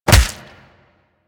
Melee Fist Impact Flesh; Warframe; Weapons; Fists

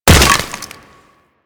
Ice Hit; Warframe

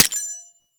Mech, Weapons; Tenno; AK Bolto Prime

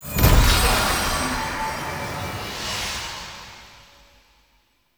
Horse Protection Ability; Vehicles; Duviri

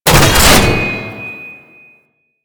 Tank Cannon Charge Start; Enemies; Weapons; Warframe; Lasrian

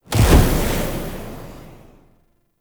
Energy Spike Cast; Powers

